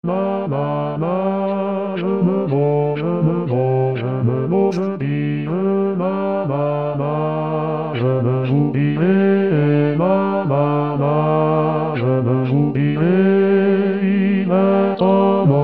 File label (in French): Basse; Certon